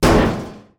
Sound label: metal hit; teardown; ps5 windows xbox series xs